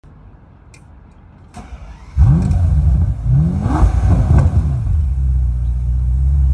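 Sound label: Bentley, exhaust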